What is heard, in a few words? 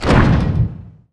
button